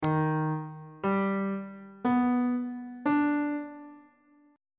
d sharp augmented major 7th chord intervals on bass clef